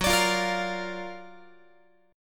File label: F sharp Augmented Major 7th F sharp Guitar; strummed; F#+M7; x,14,17,15,14,16; 4A,4E,5A,5D,5G#,6C#